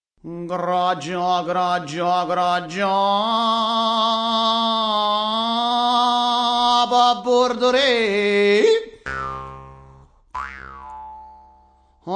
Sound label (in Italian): Tromba